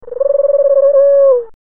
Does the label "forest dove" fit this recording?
Yes